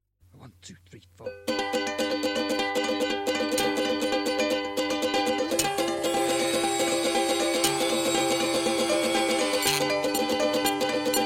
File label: Pleasant Banjo Intro With Irritating Squeak